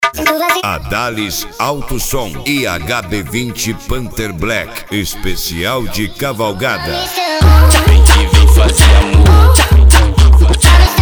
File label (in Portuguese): esp de cavalgada